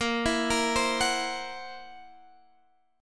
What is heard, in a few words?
F sharp 7th Flat 5th A sharp